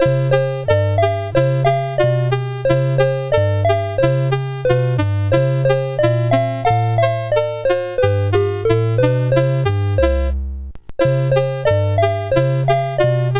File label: Yankee, CSG8580R5; M4 Yankee BASIC